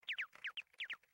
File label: walk; keronian